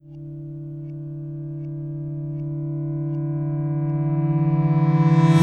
Backwards Swell, Guitars; Rock

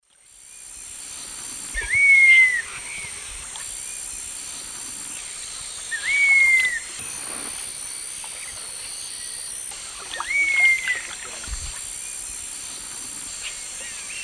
Attila cinnamomeus, call; S. America; Eastern Lowlands

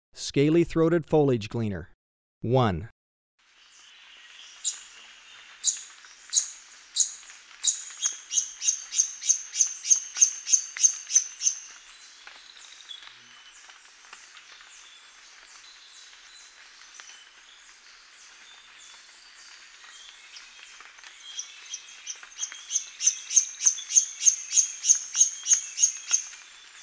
Scaly-throated Foliage-gleaner, Birds of Ecuador; S. America

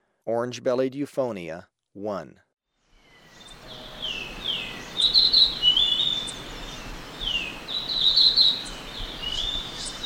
Orange-bellied Euphonia, Birds of Ecuador; S. America

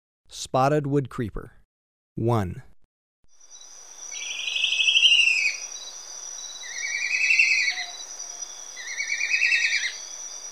Spotted Woodcreeper; S. America

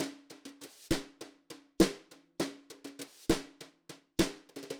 Drums, Jazz

